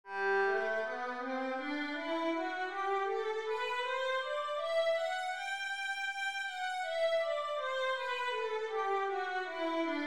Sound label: violin g major, scales 2 octave